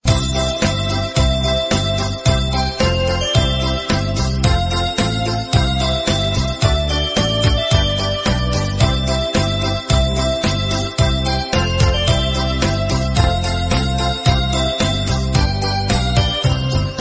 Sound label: Christmas Instrumental Ringtone; ringtones